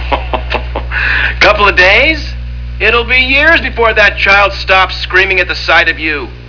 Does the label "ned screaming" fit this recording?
Yes